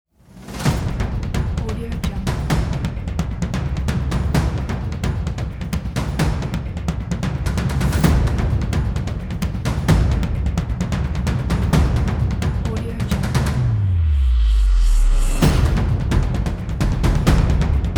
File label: trailer percussion